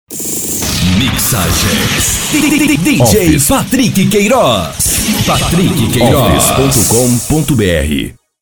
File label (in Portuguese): vinheta